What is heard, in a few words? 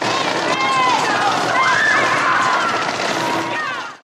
obnoxity clamoring